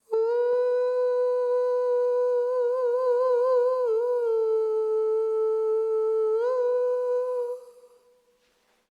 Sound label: vocals